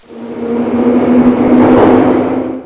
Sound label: metro start